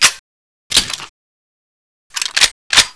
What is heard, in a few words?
reload; cstrike; infinity